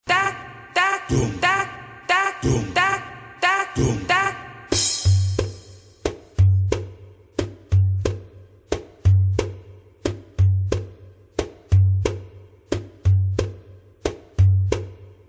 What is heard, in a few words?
karatch, rhythm